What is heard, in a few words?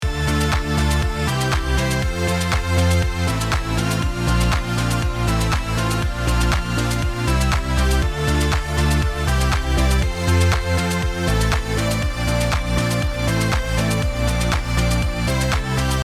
house